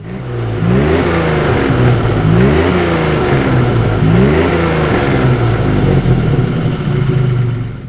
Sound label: xk150 fhc; Jaguar XK 150 3.8 FHC, 1960; Enginesound; Enginesound (idle); V3D/ ClassicarImages